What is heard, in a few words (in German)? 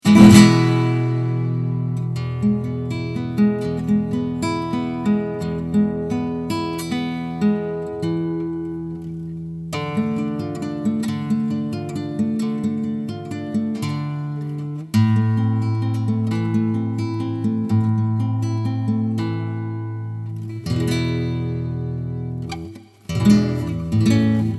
Akkorde classic